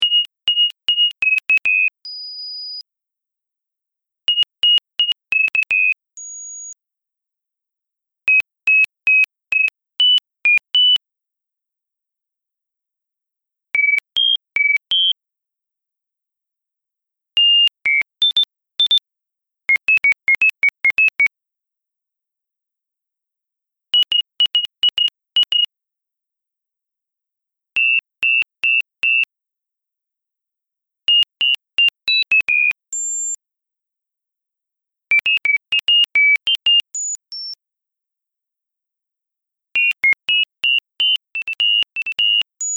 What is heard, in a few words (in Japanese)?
人工クロツグミ; 鳥の声研究室